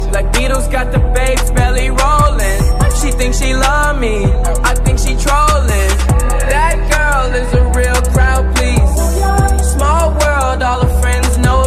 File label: new hip hop ringtone